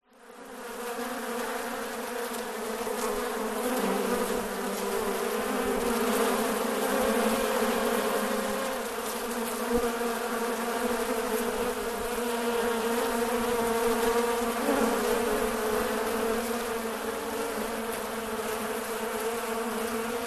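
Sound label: animals insects bees swarm surrounded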